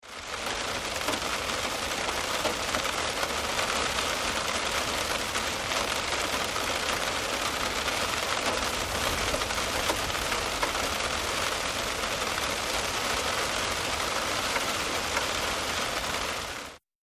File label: Rain Car Interior